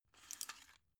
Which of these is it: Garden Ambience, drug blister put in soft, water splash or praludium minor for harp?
drug blister put in soft